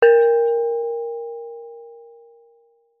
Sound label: musical instrument bonang gamelan hit soft